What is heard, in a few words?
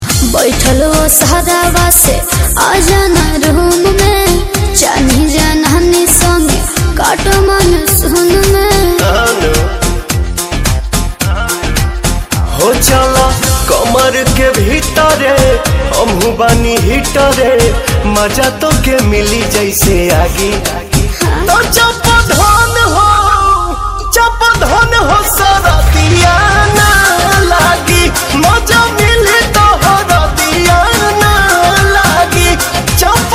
Song